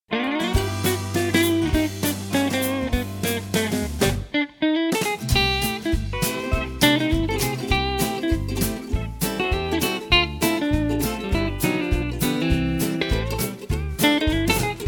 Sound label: Swing; morch pickups